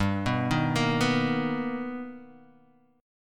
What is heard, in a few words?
B Augmented Major 7th G, chord